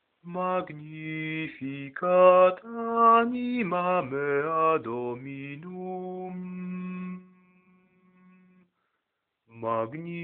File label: Magnificat bas